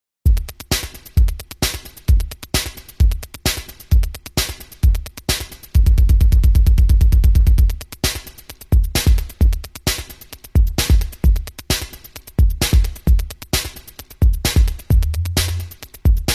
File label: Dog Funk Dub